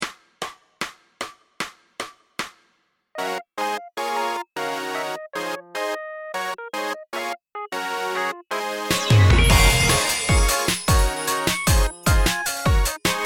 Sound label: karaoke; sdvx